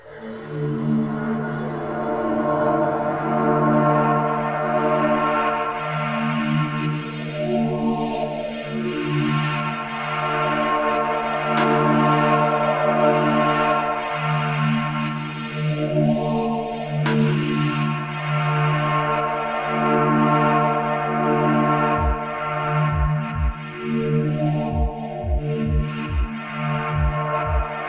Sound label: System Error Rocket Cut start; breakout